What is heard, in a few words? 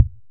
impact Wood heavy